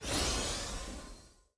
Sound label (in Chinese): 满能量提示; 战中; nba